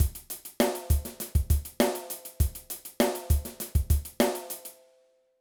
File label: Groove Example, drum